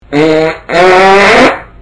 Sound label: fart